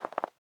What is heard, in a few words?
Soft Snow Footstep; UltimateFishing